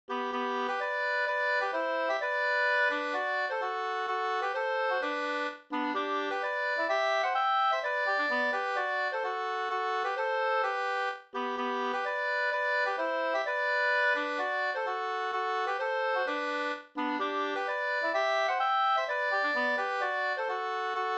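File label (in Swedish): polska, tvåstämmig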